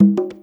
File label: Bongos